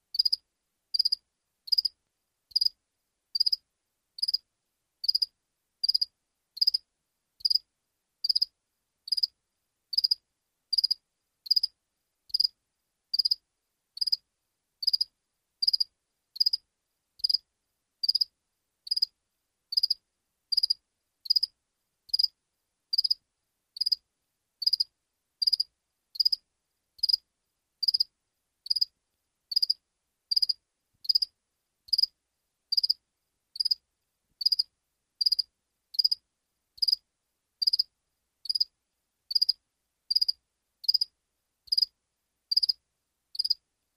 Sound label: Single Cricket Chirp